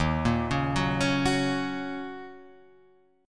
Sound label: D sharp Minor 7th